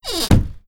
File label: coffin slow; ambient; dota; diretide